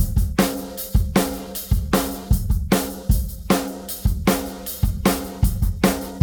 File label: drum loop 4 bar 4-4, 154